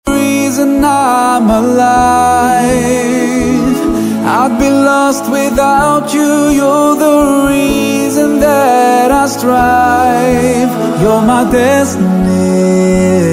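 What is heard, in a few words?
English islamic ringtone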